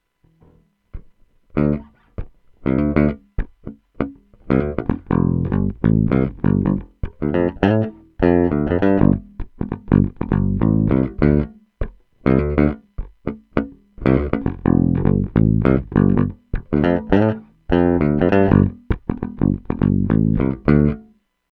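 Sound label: Bridge Pick Tone UP, peavey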